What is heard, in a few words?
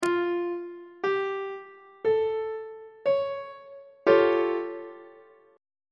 g double sharp augmented 7th 2nd inversion on treble clef